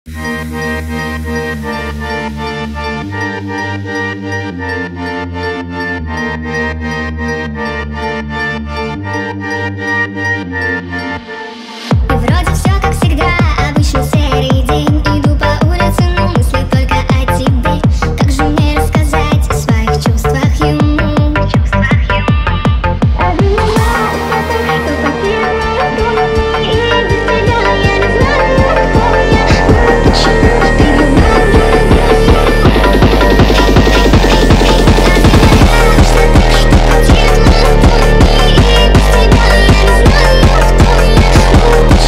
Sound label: hardstyle